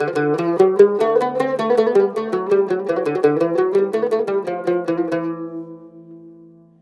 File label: CUMB, CUMBUS, Mediteranean Traditions